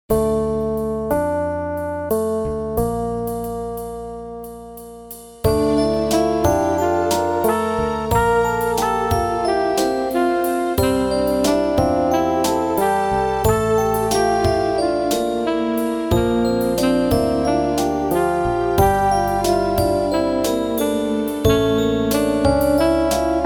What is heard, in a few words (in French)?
VERSION KARAOKE; Alex et Zoé 3